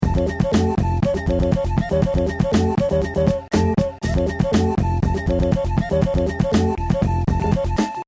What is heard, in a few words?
beat